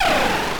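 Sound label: ship destroy; Parsec47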